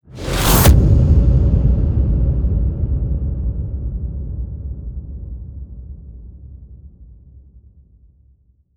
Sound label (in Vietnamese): Tiếng bass chạy qua rất nhanh